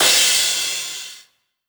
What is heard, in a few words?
Cymbal Powerful, 909; 909 Cymbals; Slate; Vintage Drum Machines Volume 3 909 Edition